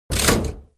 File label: lever; activities moonbase